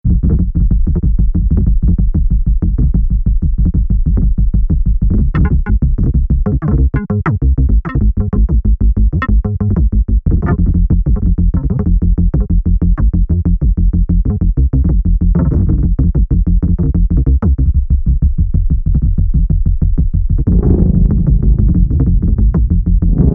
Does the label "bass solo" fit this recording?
Yes